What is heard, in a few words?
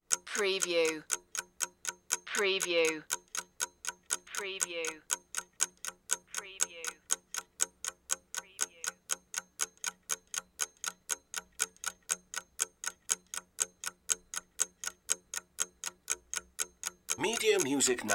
alarm clock ticking